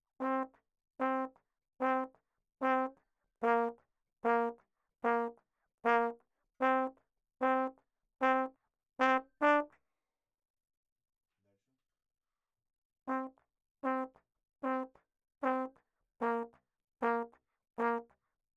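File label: RAGTIME trombone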